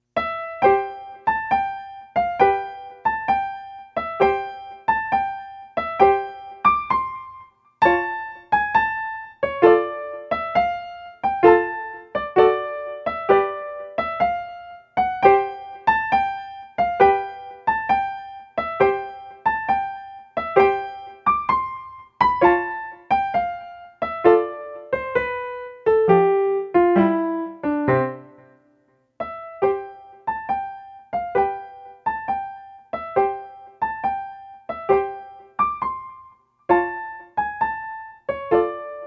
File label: Scottish Dance